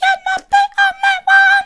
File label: soft, vocal